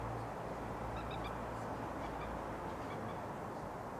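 Moorhen call, Digital audio recorder and EM172 lapel mic, kek-kek notes; nocmig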